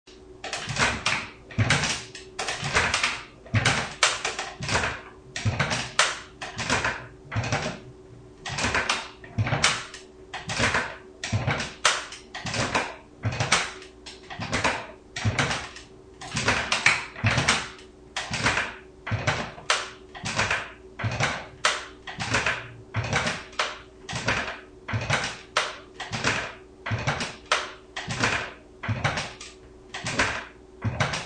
Antique rocking chair creaks violently on wooden floor.; Creaky Rocking Chair 3; Sitting; Human